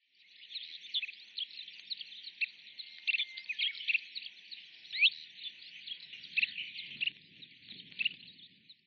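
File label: Kentish Plover, utsunomiya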